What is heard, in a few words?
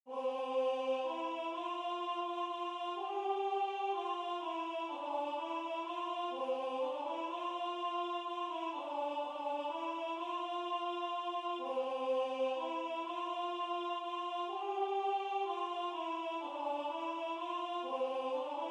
Alto